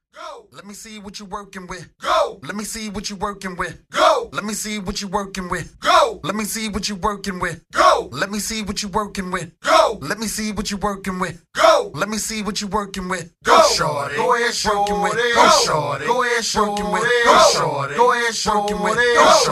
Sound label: acapella